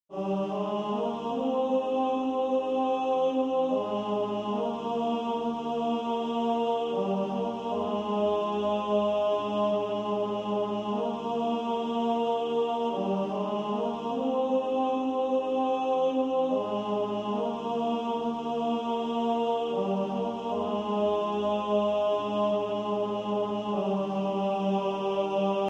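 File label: tenor, practice music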